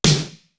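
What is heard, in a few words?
big o snare; East West; Global Sounds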